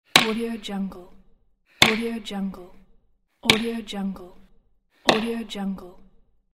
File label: axe chopping wood